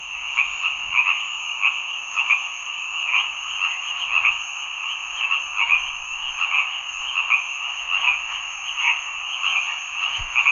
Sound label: frogs talking to each oth, croaking